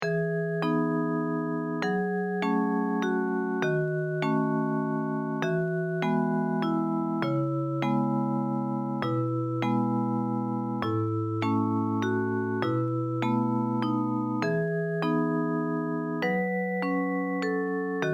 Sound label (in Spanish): short melodies melodia de los jovenes